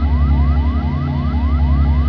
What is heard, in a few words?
RAIL